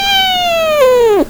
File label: Meow; GSCats; Apple 2gs